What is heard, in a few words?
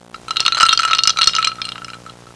ICE IN G; HAUS